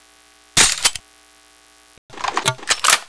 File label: m203 reload